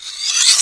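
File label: long blade; The Elder Scrolls III - Morrowind; Morrowind GOTY GOG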